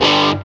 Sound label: GTR Crunch, Dirty; Club-50 - Foundations Roland